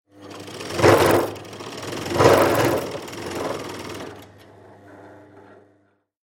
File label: Irish tufting machine